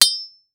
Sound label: Weapon Broad Sword Hit Stone; AdobeCC